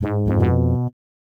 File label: fail, jingles; gbjam12